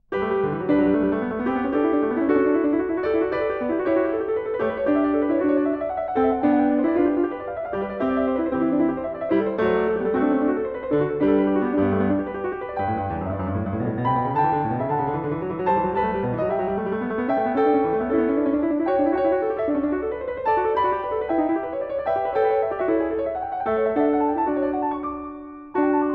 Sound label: Goldberg Variations, Variation 26 a 2 Clav.; Bach; Zenph Re-performance; BWV 988